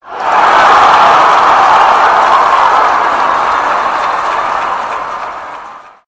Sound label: Win